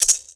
MULTI RATTLE; Best Service; World Colours

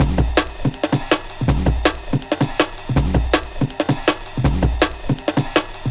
162, breaks; M8

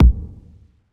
kick verb; kicks

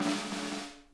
roll, Vintage Timetraveler kits; Sonic Reality - Vintage Timetraveler

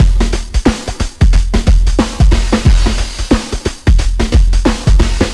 jam roll, 90bpm

